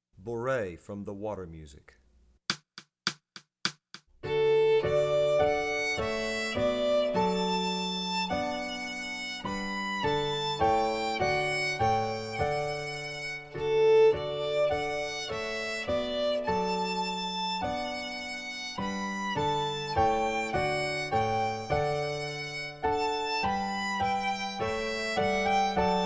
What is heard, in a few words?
Bouree from Water Music